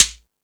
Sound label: Timbale, E-MU Pro-Cussion; E-MU Pro-Cussion-1